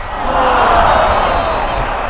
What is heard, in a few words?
AHHHH; LEGEND98